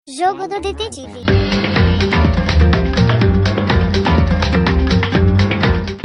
theme music; dangan ronpa

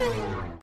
light out

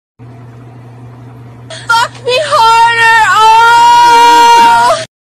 moaning

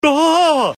bwah; hank hill

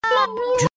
blblbl

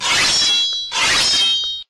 slash

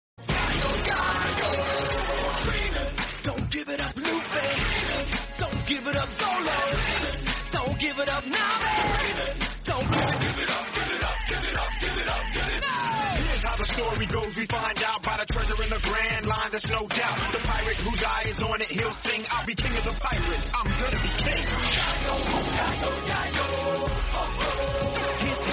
pirate rap

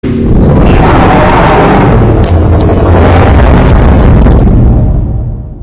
roar; titan speakerman

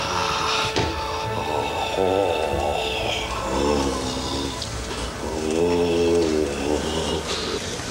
ougghh; mr bean

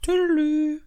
tudulu

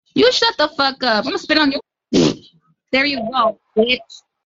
spits

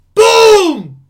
boom

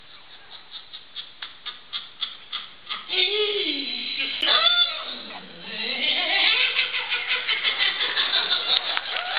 laughs

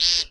meep bird

